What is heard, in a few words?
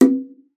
Bongos; ProSamples vol.42 - Session Instruments